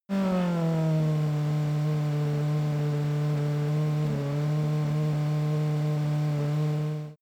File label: racing car f1 engine decelerate idle